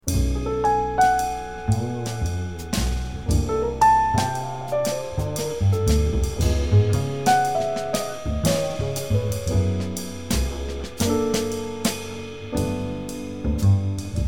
minus Melody

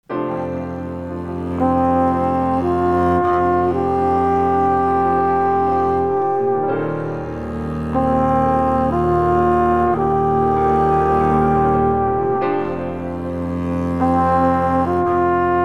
minus drums

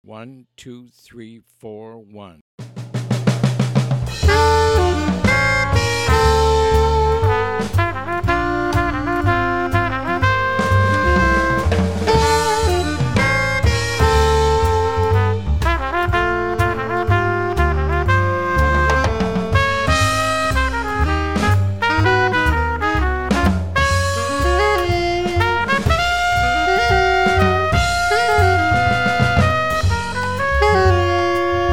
minus Piano

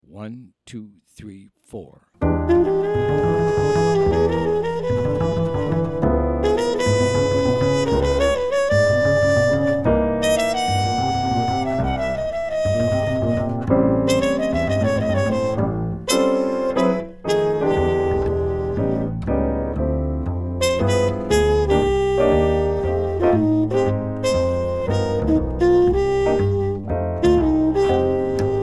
instrumental minus drums

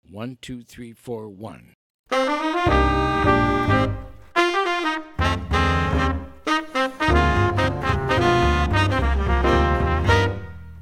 minus Drums

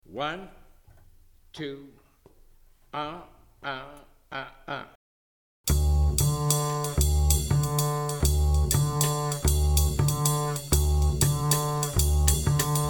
bass drums only

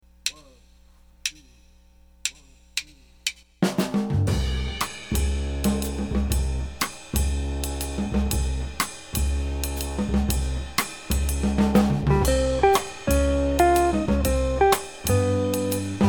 minus Piano

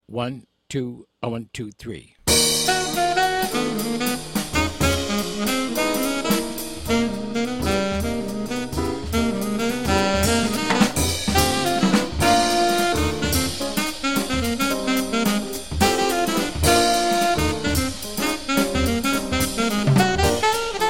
minus bass

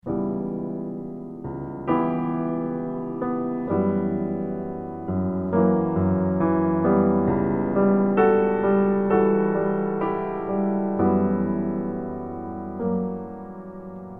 minus melody

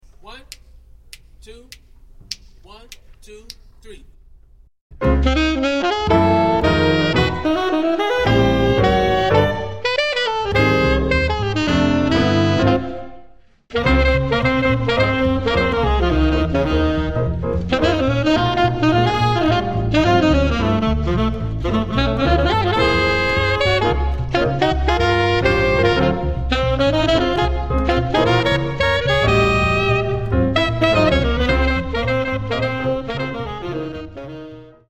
minus drums

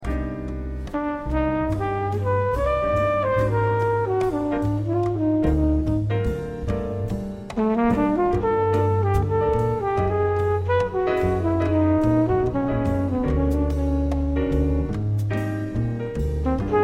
solo

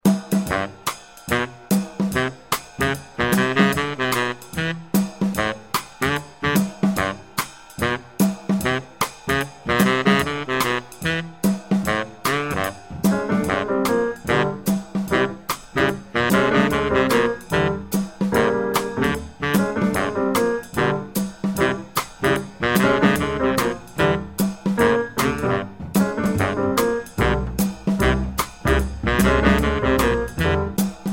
minus melody

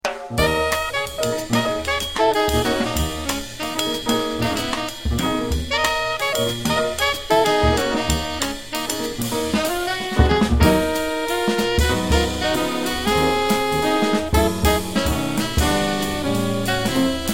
minus bass

